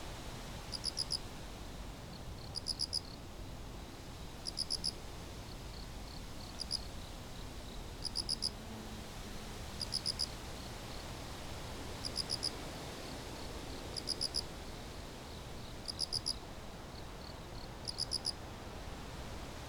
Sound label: outdoor grassland night insects; anemoi